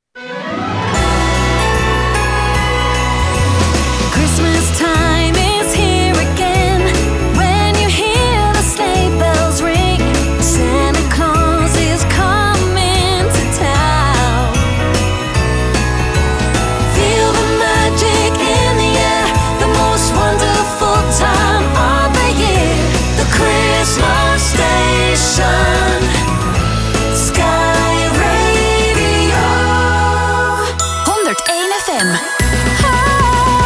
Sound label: Christmas jingles; Skyradio; 2012; christmas top 50 eerste kerstdag